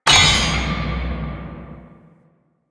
Abyss Resource Drop; Warframe; Abyss Of Dagath

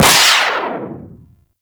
Skate Dash; Enemies; Skate Movement; Corpus Modular; Warframe; Corpus Modular Crewman

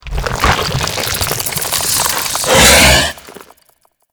Infested Bone Blade Tentacle Spike Start; Warframe

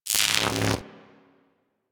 Infested Arc Rifle Fire Random A; Warframe; Weapons

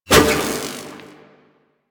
Whoosh; Warframe; Quests; The New War; Drifter

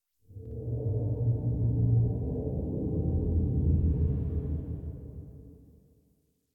Exterior Distant Calls Scared, Ambience; Warframe; Duviri

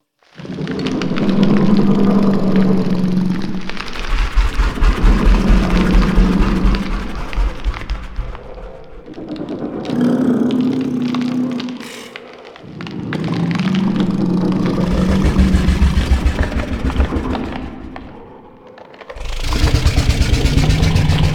Monster Door Mouth Loop; Warframe; Infested Eris; Monster Door Key

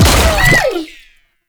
Passive Death Cast; Warframe; Nokko

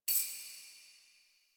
Tablet Rollover; Warframe; Duviri; Easter Eggs

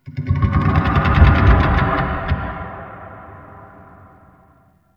Mystical Tree Creak, Wetland Forest Day Ambience; Midrath

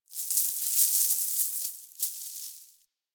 Mountain Pass Snow Hit Player; Ambience; Warframe; The War Within

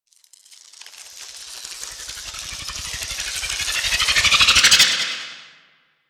Sword Blocked Energy; Cinematics; Shrine Intro; Warframe; Sacrifice

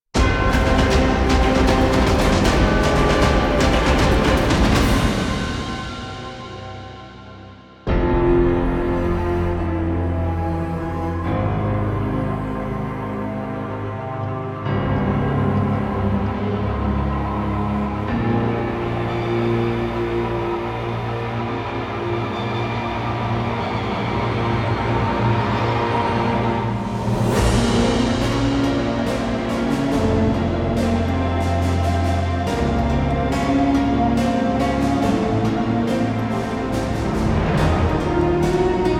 Trailer Cue, Music; Revenant Prime; Warframe; Povia Prime Market

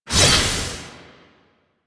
Archwing Scythe whoosh; Melee; Warframe; Weapons; Exalted Archwing Scythe

